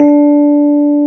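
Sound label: electric piano A3, Fender Rhodes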